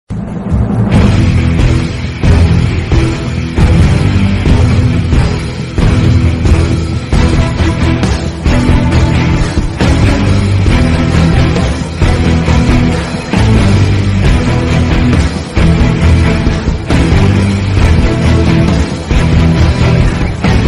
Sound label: BGM ringtone; Iron Man